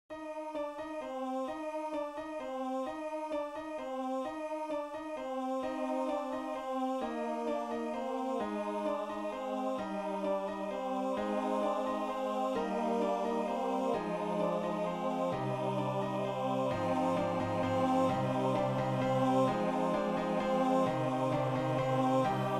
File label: bells all